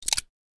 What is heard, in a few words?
colt; svencoop